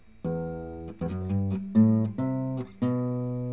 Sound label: slide